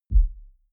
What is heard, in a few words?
sub bass soft